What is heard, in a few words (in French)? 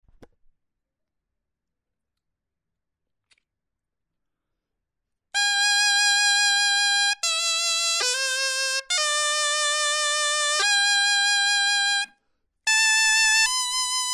clarin etude